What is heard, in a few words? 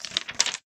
book